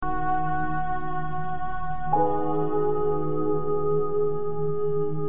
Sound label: Game Tune